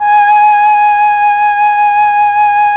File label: FLUT1; ILIO; Propeller Island Legendary M400